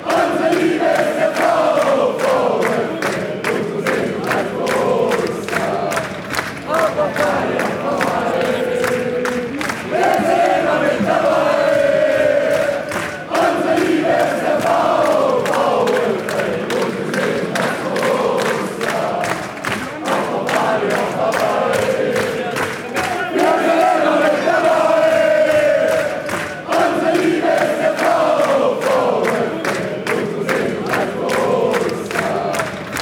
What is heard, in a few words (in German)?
wir sind immer mit dabei, fan chants